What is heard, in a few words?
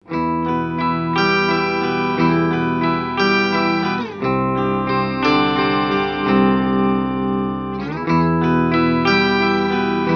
EHX Pico POG Dry Sub und Octave Up